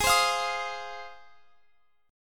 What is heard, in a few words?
Ab 6th Ab, Guitar, Open F; x,x,20,19,20,22; Ab6 strummed